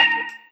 orgharm hi; Sonic Foundry (Sony Creative Software); Synchro Funk; One Shot Sonics

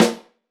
St Snare, Roland BK-5; Snares

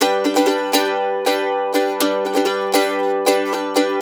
Blues Guitar Samples, RIFF; ILIO; Fretworks; CEE RIFF

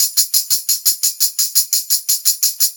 Brass Tamb, Perc Loops; Drum Tools

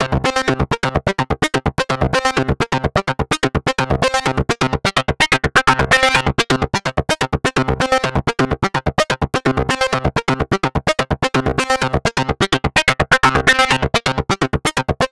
Sequence, Drums & Drones

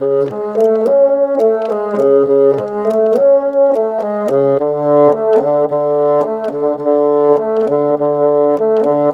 Bassoons, Cinematic; Sonic Foundry (Sony Creative Software); Orchestral Series VOL-3 - Cinematic

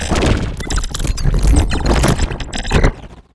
portal glitch

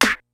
MEGA KIT, DRUMS; Kid Nepro; Elite

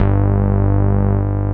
ANALOG, Synth Bass; BA1 Synth-Basses; Sound & Vision - Gigapack I CD 2

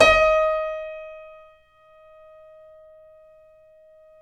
KEY, YC7 Piano ff

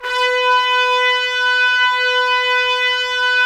Trumpets; Roland - LA Composer vol. 4

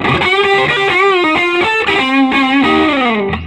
SOLO, Vintage Blues Guitar

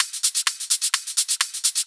Hihat Loop 128 BPM

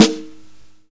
Snare Warm, Drum and Bass

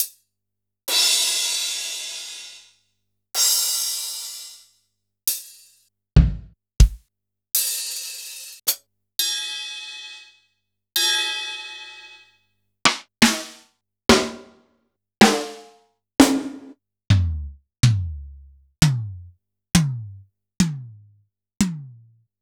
Kurzweil Kit

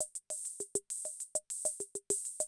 CR78, DrumLoops

